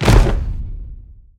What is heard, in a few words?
Drop; BeatEmUp GameTemplate3D; unity